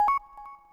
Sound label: incoming; sparkplug kit